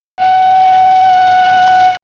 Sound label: steam